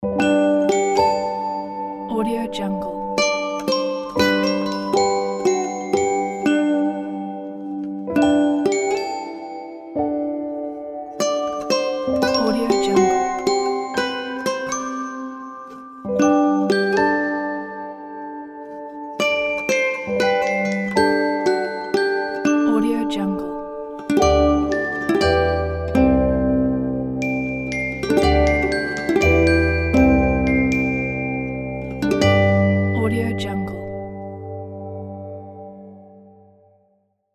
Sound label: summer breeze podcast logo intro